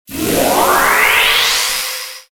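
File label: mahou on, magic sound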